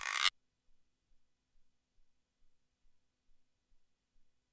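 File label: Guiro long, ELKA X1000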